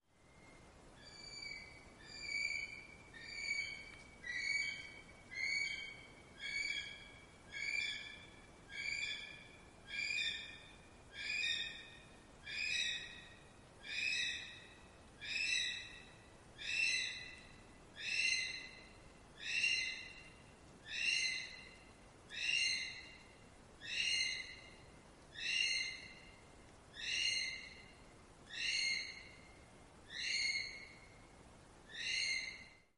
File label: Kiwi; پرنده کیوی